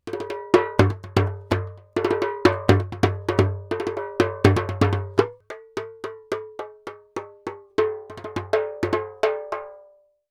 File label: percussion